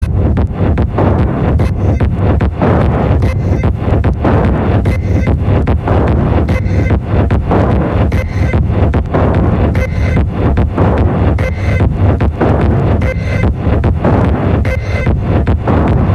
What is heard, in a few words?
Live PA; Ambasada Gavioli 1998